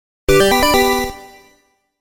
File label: Coin; Gradius